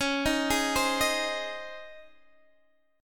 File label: C sharp Major 7th Suspended 2nd, Guitar, DADGAD